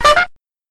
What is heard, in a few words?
doot